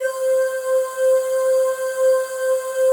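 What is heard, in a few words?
PAD, atmospheric pads; Best Service; XX Large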